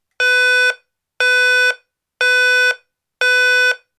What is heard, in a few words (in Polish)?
ton